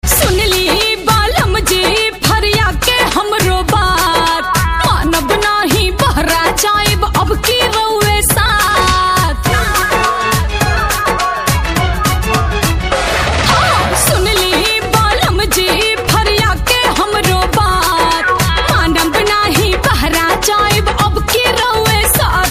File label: BHOJPURI RINGTONE